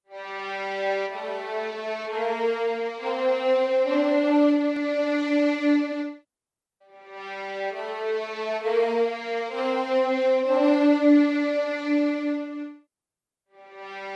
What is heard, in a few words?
First Violins Test Legato Room; LSS